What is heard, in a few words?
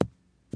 golf ball hit rough; ProStroke Golf World Tour 2007